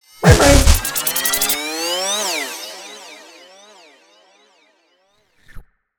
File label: bot teleport; midnight guns